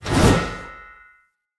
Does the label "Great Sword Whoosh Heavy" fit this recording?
Yes